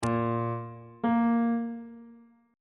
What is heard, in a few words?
c double flat note on bass clef